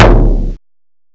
ROTOTOM, DRUMS; M8; CMI Factory

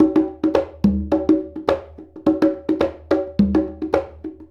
Congas; Essential Percussion

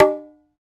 QUINTO RIM, Conga Battery; E-MU Formula 4000 Series Vol. 4; Earth Tones

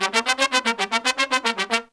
Pop & Funk Brass, R; Best Service ProSamples vol.25; AKAI

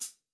Sparkle Foot B, Hats; Airwindows

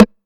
TR909 Rim

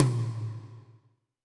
Alesis D4 Tom Hi Wet

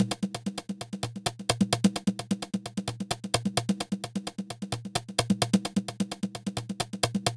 Congas, Big House; Sonic Foundry (Sony Creative Software); Afterhours EDM

